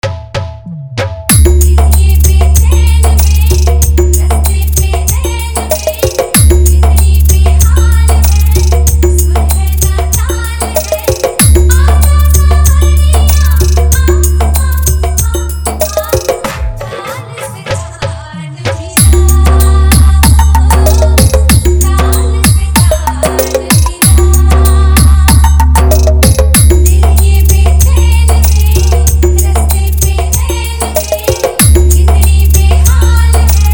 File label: Hard Vibration